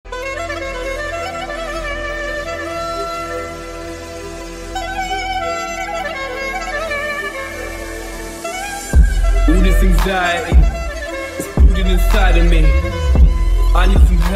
klarnet remix; vaz 2107

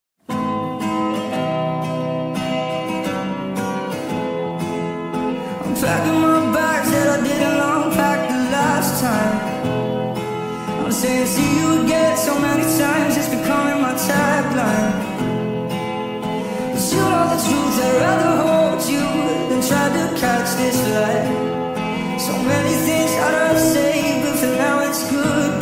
speed up reverb

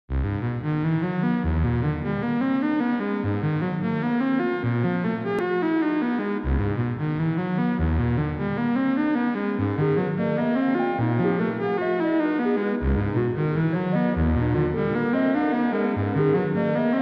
slowed x reverbed

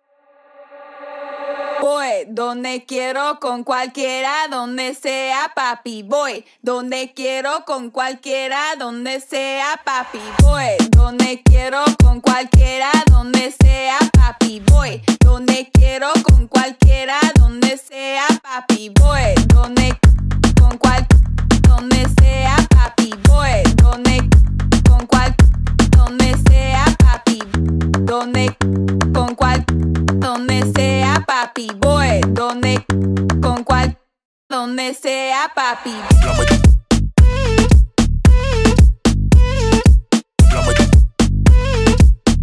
latin pop

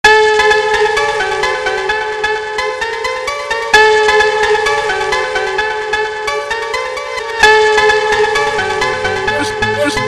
brazilian phonk

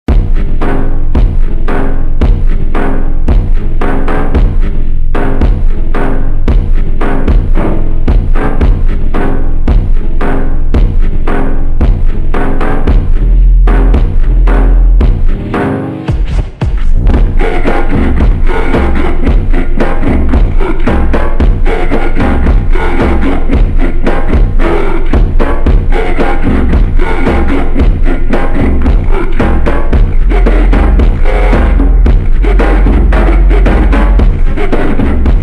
super slowed reverb

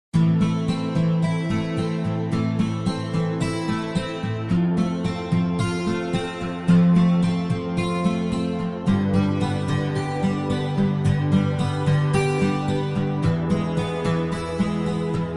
instrumental

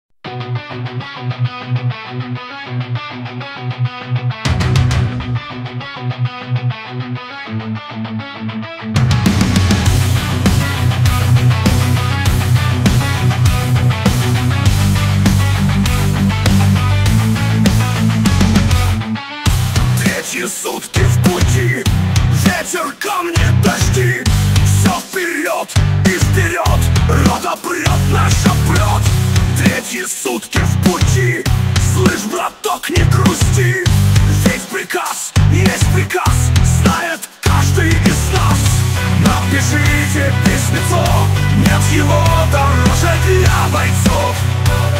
шведский хэви метал heavy metal

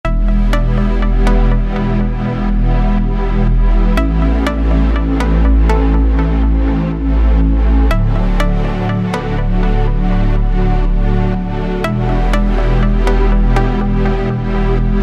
techno energy new eurodance

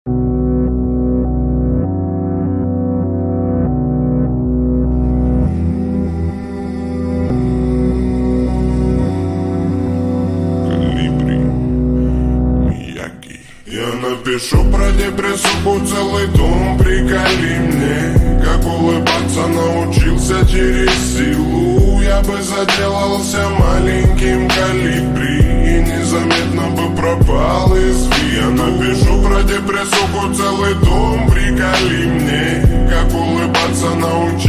slowed reverb

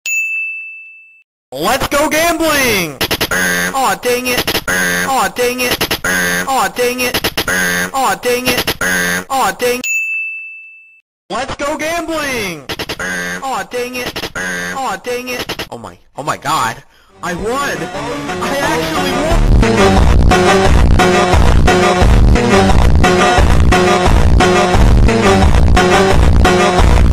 ultra funk original vs phonk

